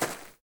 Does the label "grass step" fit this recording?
Yes